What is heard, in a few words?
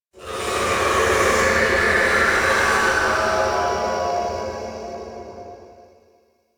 Exterior Jealous Sky Screech